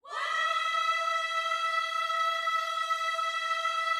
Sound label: WHOA E5, gospel; Spectrasonics Vocal Planet